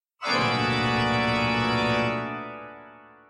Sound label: scary sting